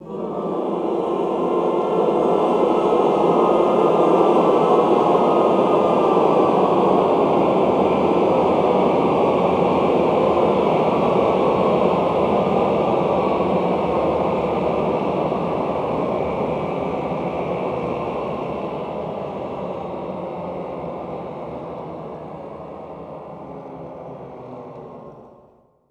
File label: CLS WAVE, Classical Choir; Best Service; Extended Classical Choir; CLUSTER